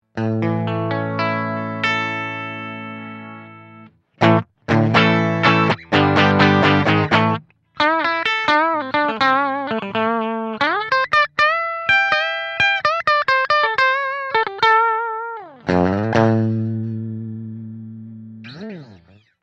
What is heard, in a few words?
gigmax clean; greco